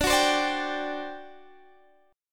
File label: D 11th D, down, Guitar, Half Step Down